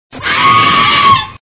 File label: ahhhaahh; wizard of oz